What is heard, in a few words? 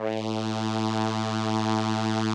SOLINA, String Machines